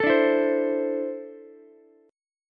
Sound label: D 7th Suspended 2nd down Ukulele; D7sus2 strummed